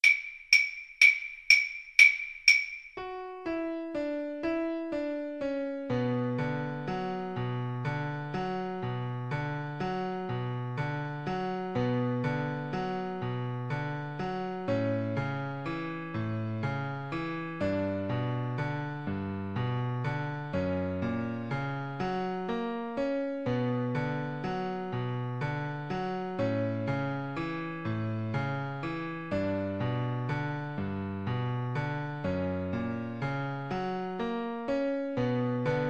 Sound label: spanish violin